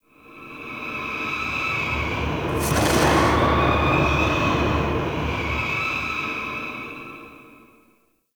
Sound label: Ghost Horse; Ambience; Dagath; Warframe; Clan Dojo